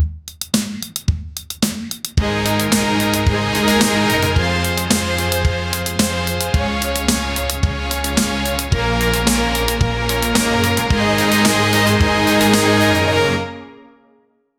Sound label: ukrainian intro music